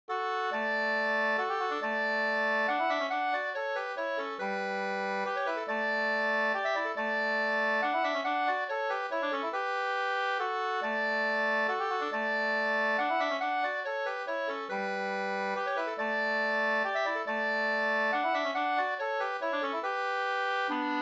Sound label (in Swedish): langdans; Lilla langdansen Bingsjo Da 2st